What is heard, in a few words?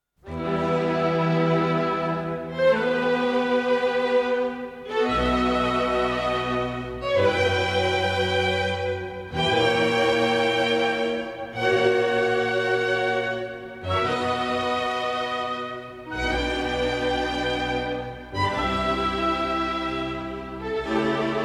dotted rhythms